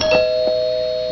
ding dong

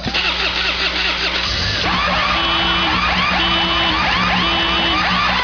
car start

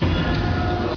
wrong

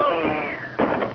trapdoor

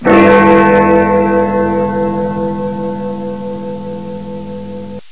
timer

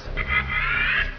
lockin

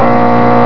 wrong no time; usa

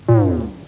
strike; poland

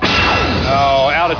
times up bonus; usa

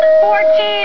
ding

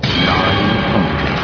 bong

